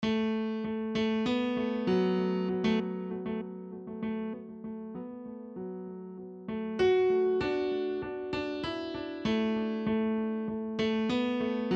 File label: Drum build